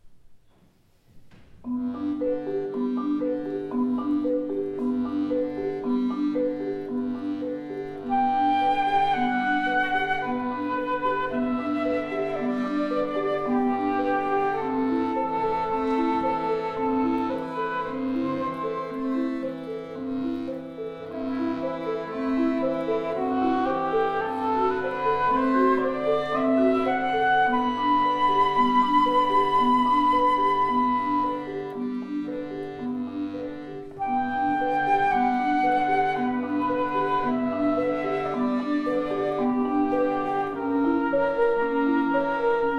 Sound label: marimba flutes